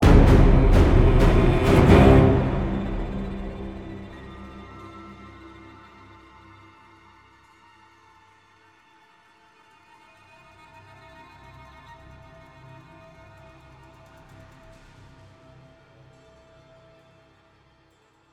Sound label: Death Stinger